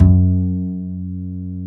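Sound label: double bass F#2, Jazzistic; Univers Sons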